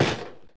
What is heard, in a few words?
soda can stomp; soundscrate